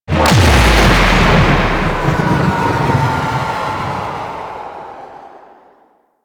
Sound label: Artillery Sky Explosion; Enemies; Abilities; Teralyst